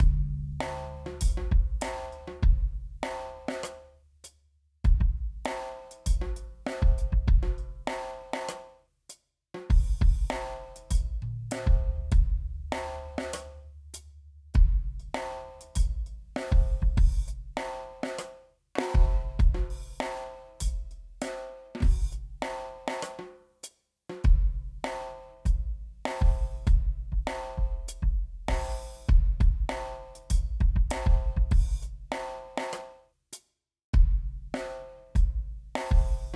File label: after snare, sidechain; 侧链EQ聚焦于军鼓后; Waves